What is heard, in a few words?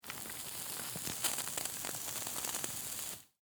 Foley, Cigarette, Inhale, Sizzle; Smoking; Human; Smoking Sound Effects